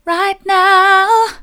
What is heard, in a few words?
right now soft, vocal